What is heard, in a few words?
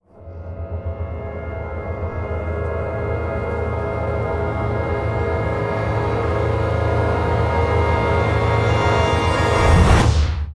Suspense Tension Build Up Sound Effect